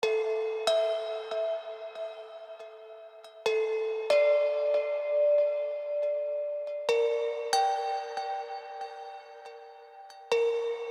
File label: bells